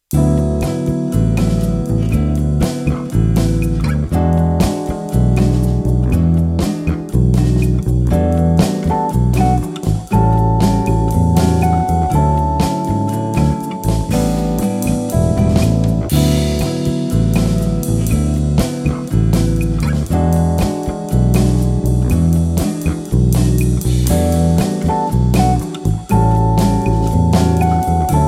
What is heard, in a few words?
Easy Bossa